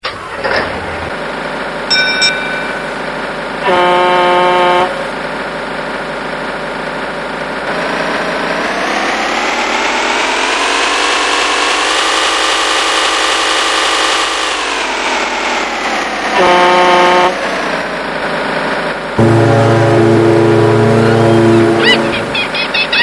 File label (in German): Schiff Schlepper; Soundbeispiele